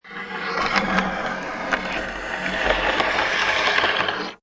Coffin Slide Lid, Ancient wooden vampire coffin; crypt, grave. Slide off top slowly. Also good as general wood slide; Horror; Creepy Door Sound Effects; LynneMusic

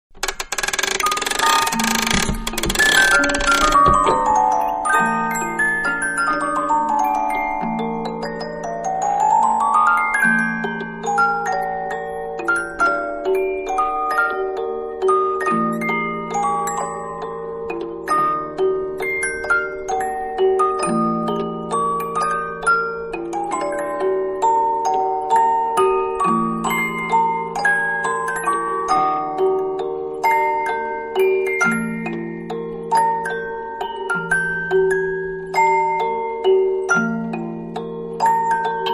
Music Box Wind And Play; Toy Melodies (Sound Effects:Toys); Manhattan Production Music

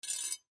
Croquet Metal Gate Pick Up Scrape; SPORTS; Croquet (Sound Effects:Sports)

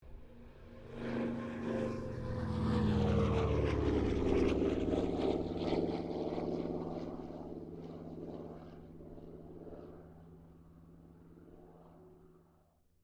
AIRCRAFT CA-13 BOOMERANG TAKEOFF; Airplanes (Sound Effects:Vehicles)

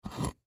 Bowl Pick Up Wood, Bowl Pick Up Wood Table Dish; Household; Dishware

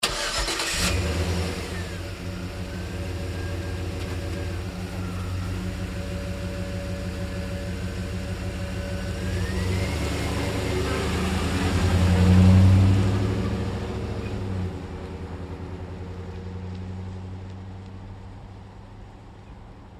Truck GMC Savana Start Away Slow; Vehicles

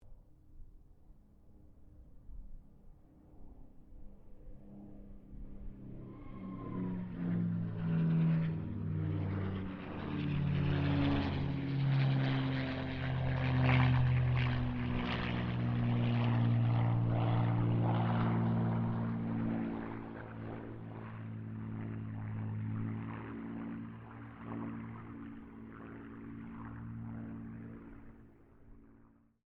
AIRCRAFT CA-18 MUSTANG PASS DISTANT; P51 /CAC-18 Mustang; Airplanes; Vehicles; Aircraft Collection; Twistedtracks

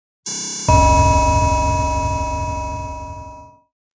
Ringer; Rollover or button sound perfect for web pages, flash animation, and other web applications.; Rings; Electronic

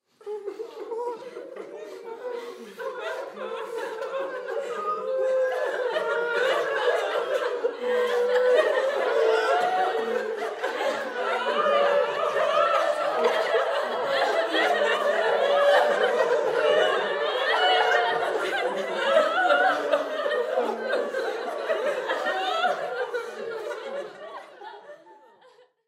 Human Crowd Studio; Crowd Reactions Cry Long; Sound Effects:Voice

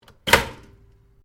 Toaster Popup Pop; Various kitchen appliances; Household

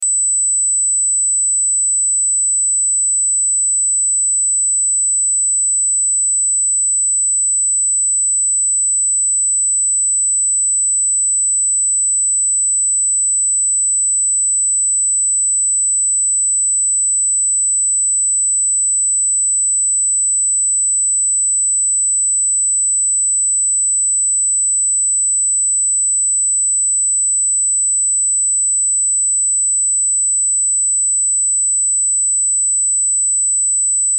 Test Tone Sine; Test Tone Sine Wave 8Khz 60 Sec -12Db Loop

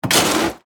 Ice Chest Scooper, Stab, In, Ice, Restaurant; Miscellaneous Bar; BlastWave

